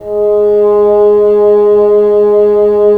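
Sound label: WND BN, wind bassoon; LA Composer vol. 3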